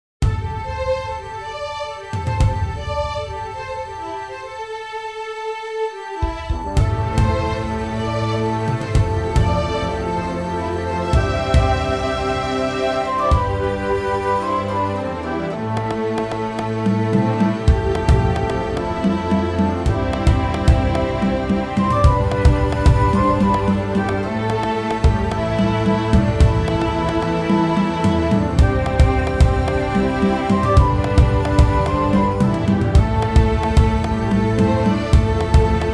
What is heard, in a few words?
Dramatic Opener